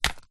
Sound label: household medicine laxative powder sachet set down wood